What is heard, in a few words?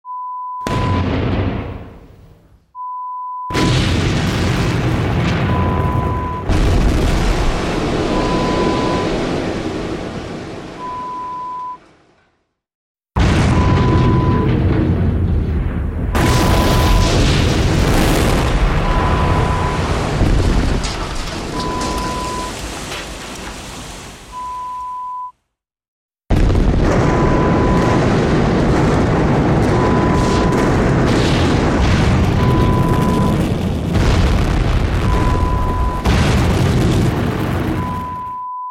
Explosion sound Collection